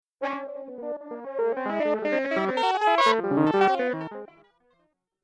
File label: slow phrase; philippos